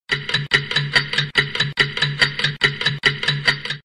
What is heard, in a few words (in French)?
Bruitage Tic Tac; Effets Sonores